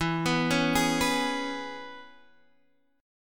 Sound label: E 6th, down, Guitar, Standard; arpeggiated; capo=6